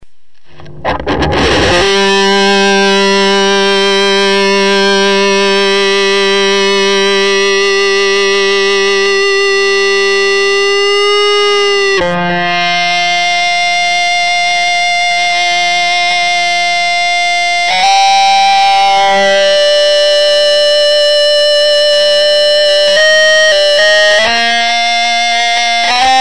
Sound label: feedback